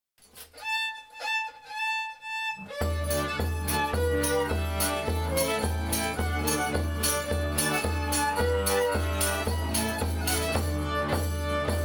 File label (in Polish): polka